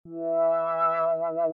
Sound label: tone